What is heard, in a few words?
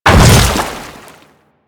Hammer Boss Land Water, Footsteps; Warframe; Grineer